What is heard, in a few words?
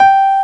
piano G4; ClockWerx